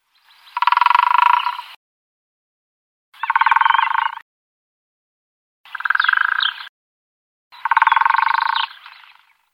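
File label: Syrian woodpecker; Dyatel siriyskiy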